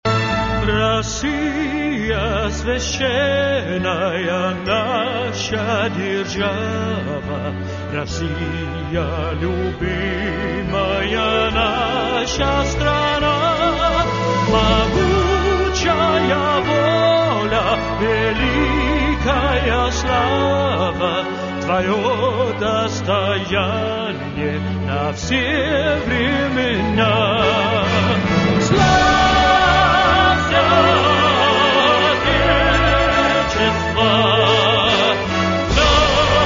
Russian National Hymn

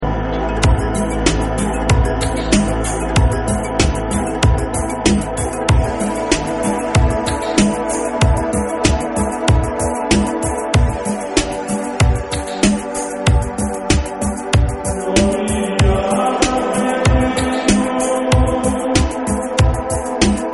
Ritmo

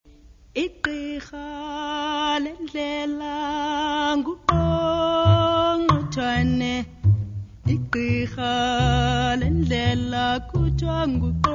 Click Song

